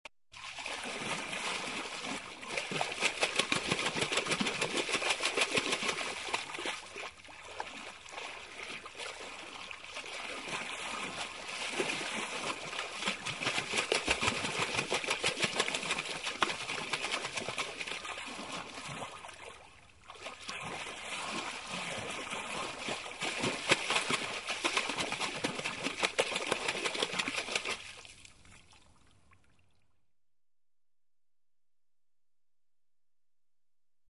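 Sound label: Splashes on water by hand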